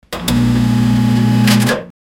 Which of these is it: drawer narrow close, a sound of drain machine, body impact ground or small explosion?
a sound of drain machine